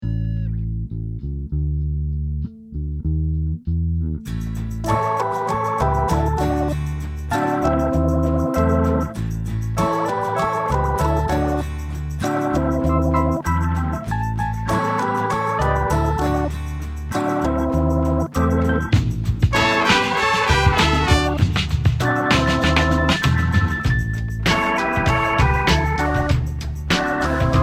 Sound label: Funky